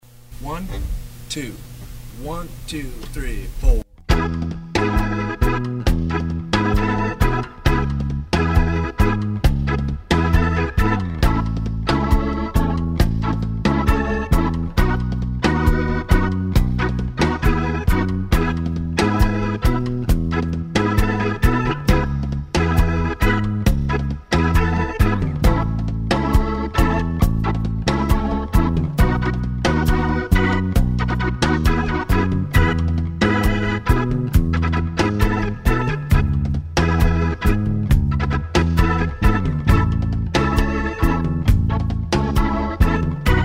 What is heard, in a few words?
with drums